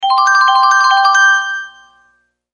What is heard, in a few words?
Bell Alert